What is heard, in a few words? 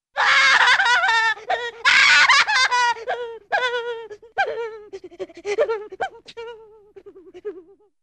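Funny Little Girl Cries with sobbing & Sniffing; Cartoon; Hollywood Edge; Cartoon Trax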